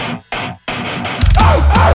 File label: bark